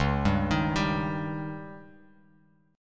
C sharp Suspended 2nd Flat 5th C sharp Bass Standard; C#sus2b5; arpeggiated; JGuitar